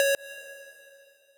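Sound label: square lead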